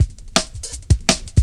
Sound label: Rim Shott Cut, Drum Loops 166.5; Zero-G Jungle Warfare 1, 2 & 3